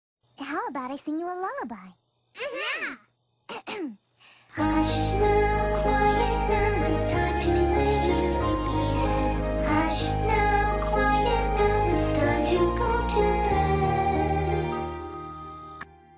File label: Lullaby, Orchestral